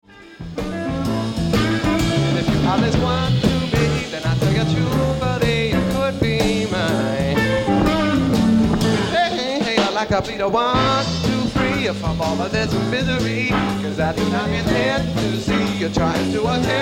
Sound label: Shuffle